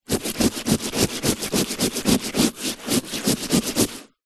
household cleaning rubbing very wet carpet

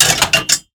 Impacts; Just Impacts; Extension I; soundbits

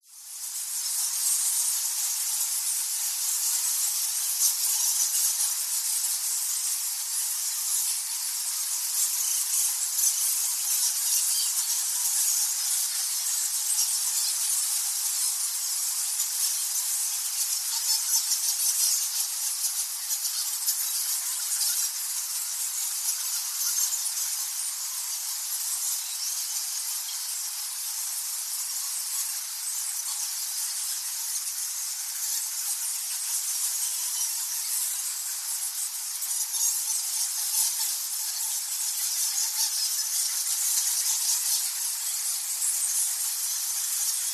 bats thousands indonesia bali cave temple; goa lawah

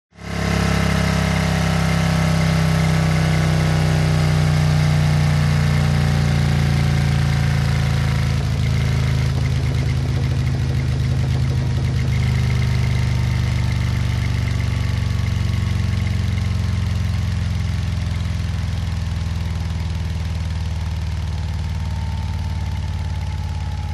MOTORCYCLE Harley Decelerate Long; TRANSPORTATION

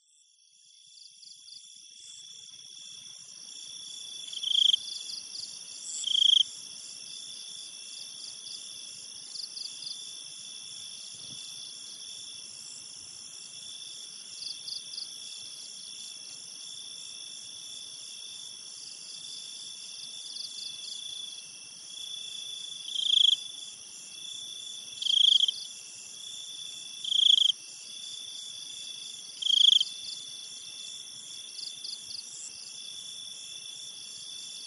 thailand jungle night insects close up and dist

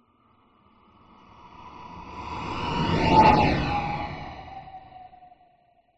science fiction spacecraft pass by

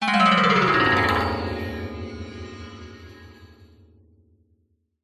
horror accent plucked strum descend fast washy

